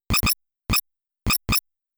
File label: Death; Xevious; Zakato -Death Out